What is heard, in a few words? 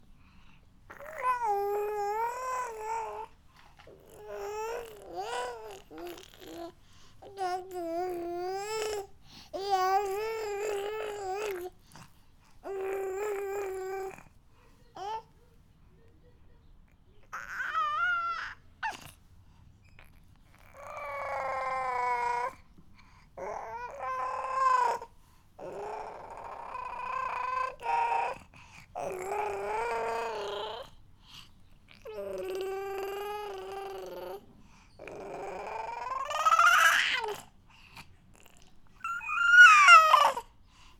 Baby Coo